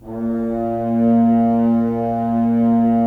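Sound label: Solo French Horn, Brass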